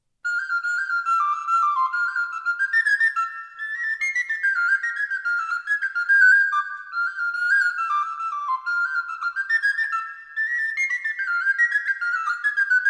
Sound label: Folia solo Fantasy Variations, recorder